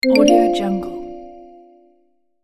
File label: ui notification